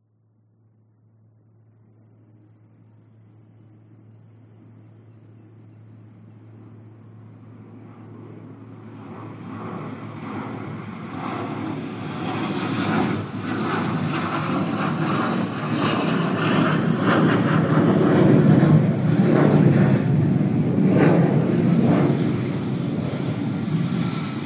vehicles airplanes military 2x f15 or f16 fly past